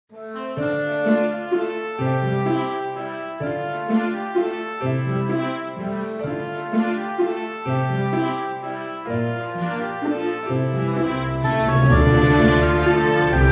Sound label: Mysterious Forest